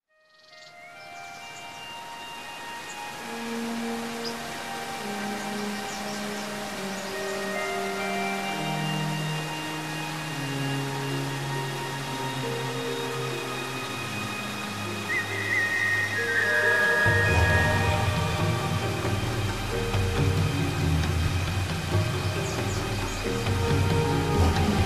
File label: atmosphere, animal kingdom; sunset on tree of life; disneys animal kingdom